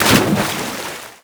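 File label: water hit; Matt Hoffman's Pro BMX 2; XBOX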